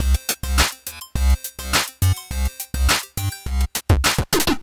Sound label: lead beats; cube-tube; pyramids